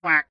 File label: duck indifferent